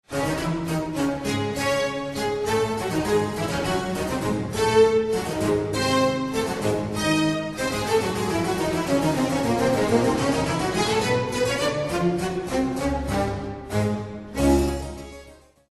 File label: Harpsichord Concerto; Bach